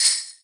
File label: Jingle Bell; Zoom RT-123